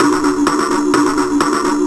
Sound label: VFX2 Loop 128 BPM